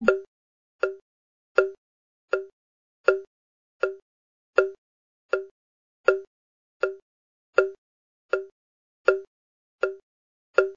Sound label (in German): click, volksmusik bass